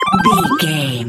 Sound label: collect item sparkle pop